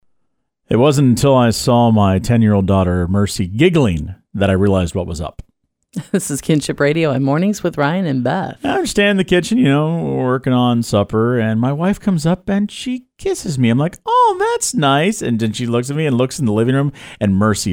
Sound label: Mercy collapses in Laughter